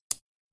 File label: chip pickup chip pile